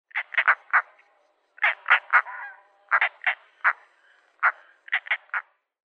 Blauracke Coracias garrulus; Vogelstimmen

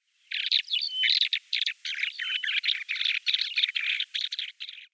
Stummellerche Calandrella rufescens; Vogelstimmen

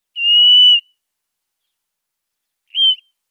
Blaunacken-Mausvogel Urocolius macrorus; Vogelstimmen

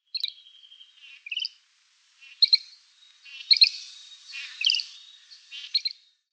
Raubwuerger Lanius excubitor

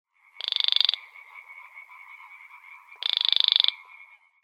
Zwergsumpfhuhn Porzana pusilla; Vogelstimmen

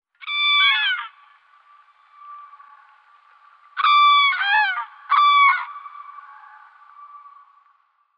Kranich Grus grus; Vogelstimmen

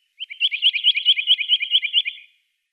Felsenkleiber Sitta neumayer; Vogelstimmen

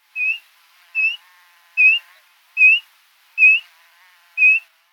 Gleitaar Elanus caeruleus; Vogelstimmen

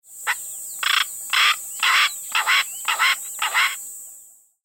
Gackeltrappe Eupodotis afra; Vogelstimmen

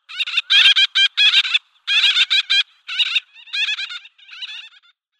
Lachseeschwalbe Sterna nilotica; Vogelstimmen

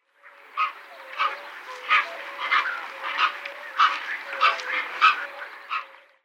Krauskopfpelikan Pelecanus crispus; Vogelstimmen

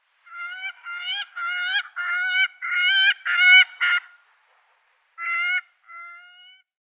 Gerfalke Falco rusticolus; Vogelstimmen

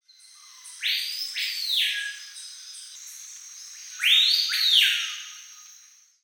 Schreipiha Lipaugus vociferans; Vogelstimmen

